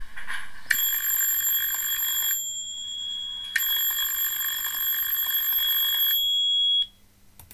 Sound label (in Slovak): Zvoncek modular RXB 230 a 12V